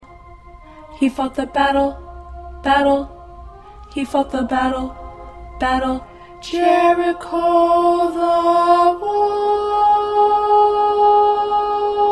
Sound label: Alto 2